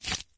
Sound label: flesh, footsteps, run; Left 4 Dead 2